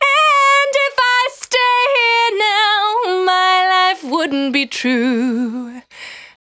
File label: Cry 68bpm B, Vocal; Zero-G